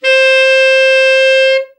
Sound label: TEN SFT, SAX; Best Service Gigapack 1; BR SAX 3